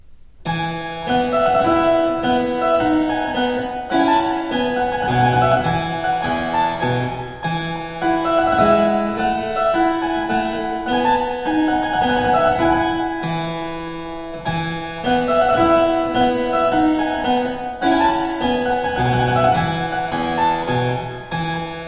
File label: Minuet Suite for harpsichord in F major; A Choice Collection of Lessons; Henry Purcell